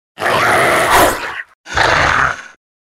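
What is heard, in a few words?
werewolf is angry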